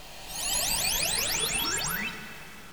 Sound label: teleporter end; cirnogodot